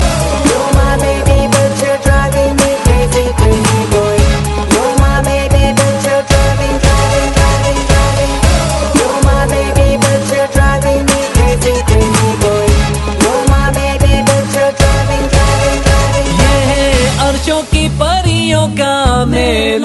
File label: Om Mangalam Chorus